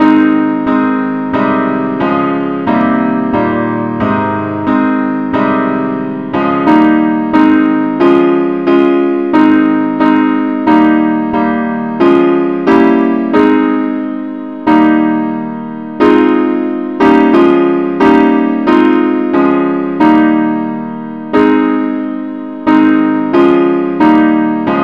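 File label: advance accompaniment key aes; christe Sanctorum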